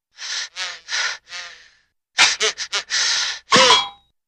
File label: Fly Pants Falls W buz, fly